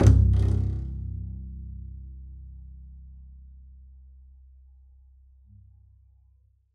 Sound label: pizz c1, double bass